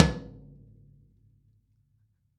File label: Floor tom